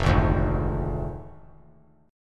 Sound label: F Minor 6th F down; Fm6; strummed; JGuitar